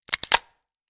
zvuk pereloma kostey

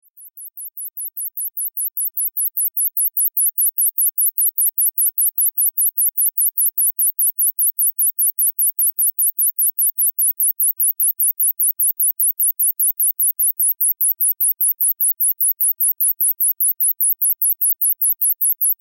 moshkara

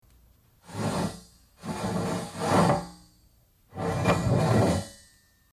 kryshku liuka dvigaiut

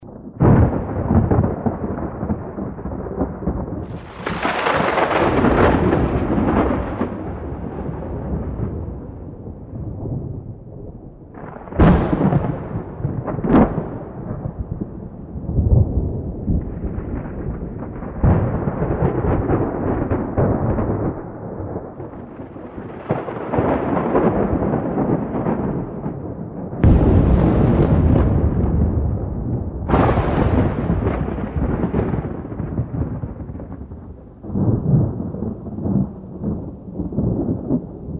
groza grom

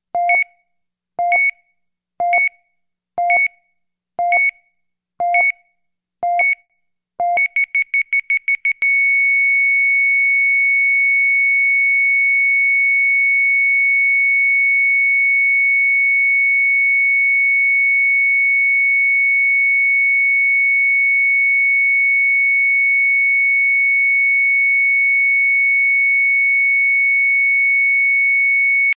kardiomonitor ostanovka

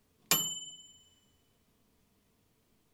dini mikrovolnovki

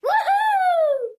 zvuk radosti detey

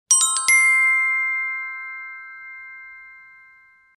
oslepitelnyaya ulybka

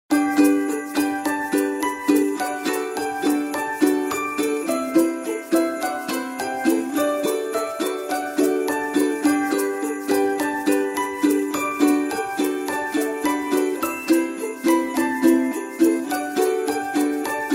krasivaia muzyka rojdestva

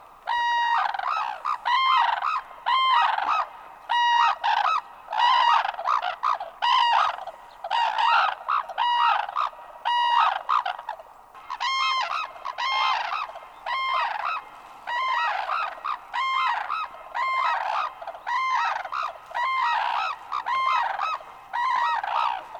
vencenosn zhuravl

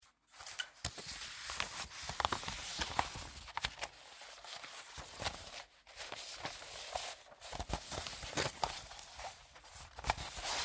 zvuk bumagnyh stranic

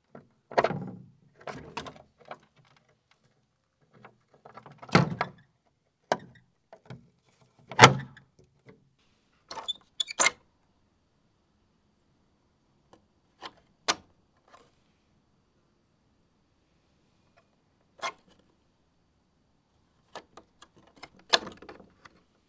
dvernoy kriuchok, zvuk dvernogo zasova

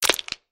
zvuk pereloma kostey reber

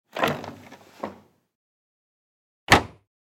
lotok bumagi kseroksa

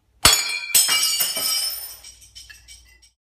chashka razbilasi

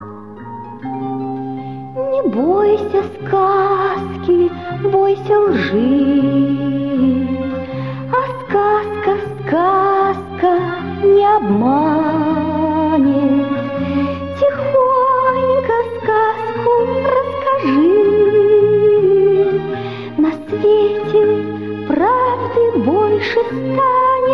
muzyka dlia nachala skazki

